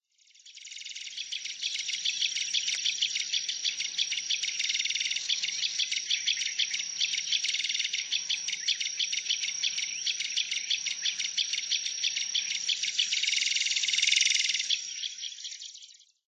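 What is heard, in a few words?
Marsh Grassbird; watarase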